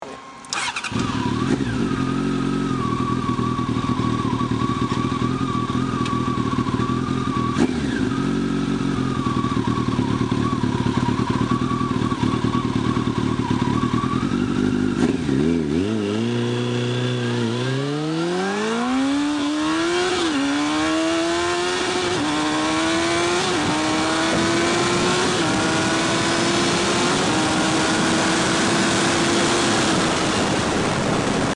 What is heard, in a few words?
CBR TURBO